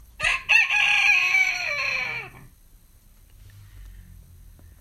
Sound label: rooster